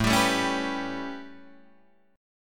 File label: A 9th A, Guitar Open D